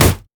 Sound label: mech melee basic attack; blue; minions